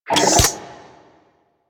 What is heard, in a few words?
Sidearm Charge; Weapons; Warframe; Tenno; Wraith